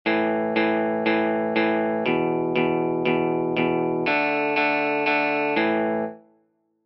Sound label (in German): Power chords uebung